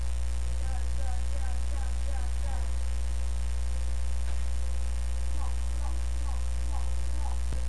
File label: computer